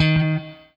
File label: ROCK, Guitar; 90 s Sample CDs; Quantum Leap - Guitar & Bass